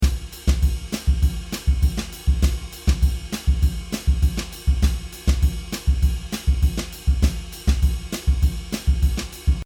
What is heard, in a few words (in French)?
rythme jazz samba 100bpm